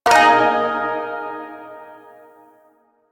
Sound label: Shawzin Yo Chord H, Music; Chords